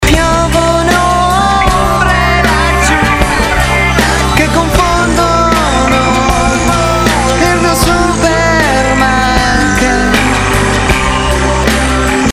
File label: Ringtone